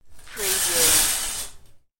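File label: Shower Curtain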